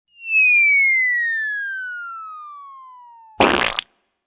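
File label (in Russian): свист полета и приземление со звуком пукания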